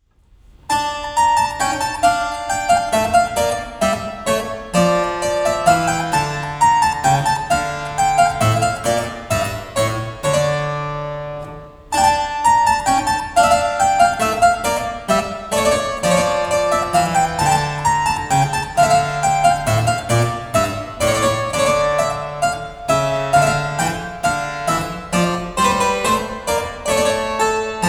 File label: menuet